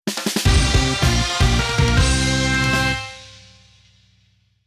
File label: Jingle; Fate Tiger Colosseum; Fate-Tiger Colosseum ORIGINAL SOUND TRACK [Complete Edition]